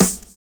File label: SUB HH; Univers Sons - Kitch 70s